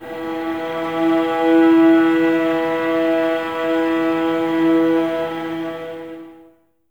STR VAS E, Violas, No Vib; Miroslav Vitous

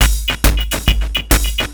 percussion loops, Techno; PS-45

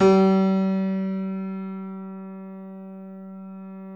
C7 F, The Ultimate Piano Collection; East West

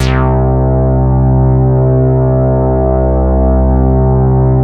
C3, BASS; Q Up Arts; Dream Experience; BASS BANK 12

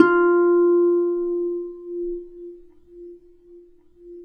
F3 HARP; USB Soundscan vol.62; AKAI

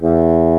WOODWINDS

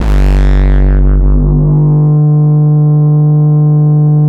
HD BD, Hard & Loud Techno

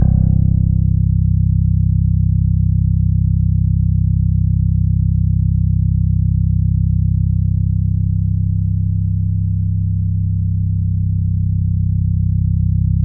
C1, SynthLine; 90 s Sample CDs; NiceBeats; SynthLine VOL-33

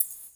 DANCE SET, DRUMS

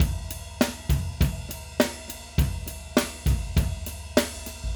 Acoustic Live Drum; Platinum Audiolab

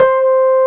SUITCASE, Keyboards Old School

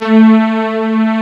WARM A A2, D50; D50 STORY; East West; Global Sounds

